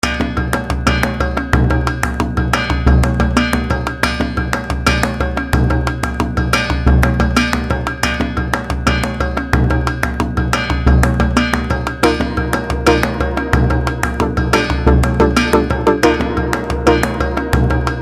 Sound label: perc azteca